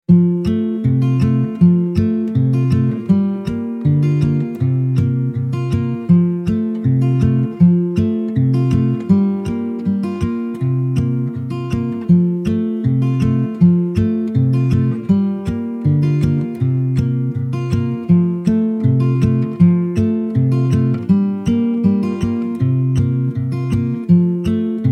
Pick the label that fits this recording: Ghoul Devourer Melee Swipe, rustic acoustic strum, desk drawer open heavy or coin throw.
rustic acoustic strum